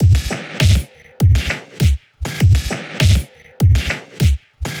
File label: 100 Slow Revolt, Electric Drums; Loops; Touch Loops; CosmicFunk